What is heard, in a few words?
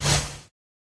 lobby landing; smite; chronos